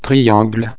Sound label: triangle